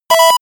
notification bleep

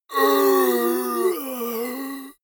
monster growl hoarse; koerpergeraeusche; comedy-cartoon; soundpool

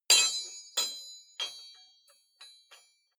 metal impact bar ring rattle long; industrie-wirtschaft

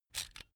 electric drill template adjust regular; soundpool

